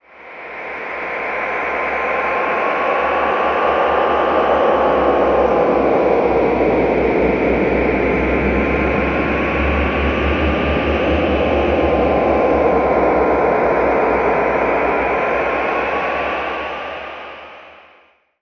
Scary Place, Dark; Zero-G Ambiosis

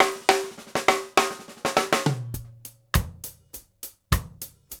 HELLO FILL; AMG Gota Yashiki Groove Activator

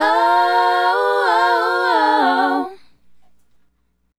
Female Group, Hip-Hop and RnB Vocals

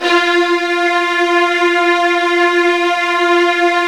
F1, Virtuoso Strings

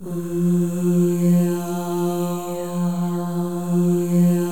MOVING V1, Symphony of Voices; Spectrasonics

